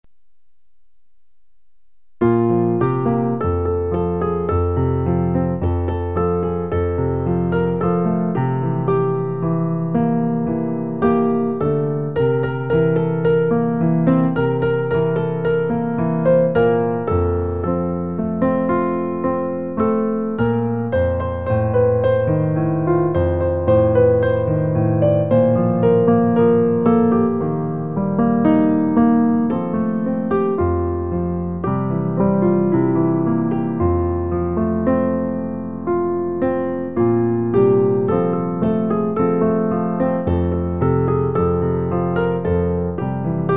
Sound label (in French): piano